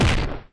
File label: punch; roblox